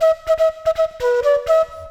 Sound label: FLUTE ETHNO 125